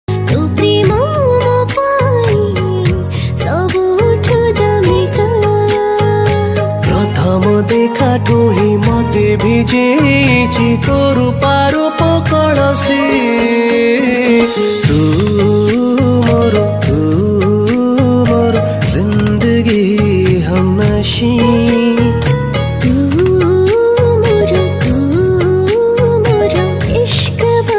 New Odia Romantic

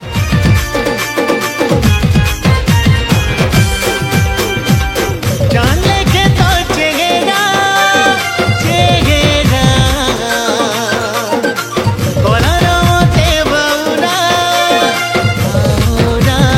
E NEW SAMBALPURI SONG STUDIO VERSION

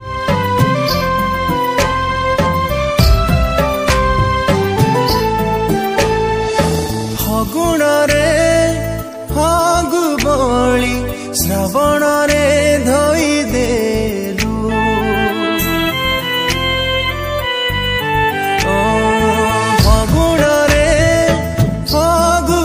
Studio Version Odia Romantic Song

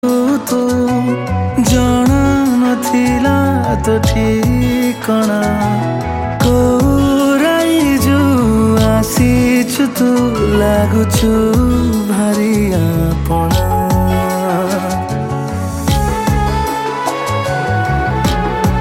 New Odia Song

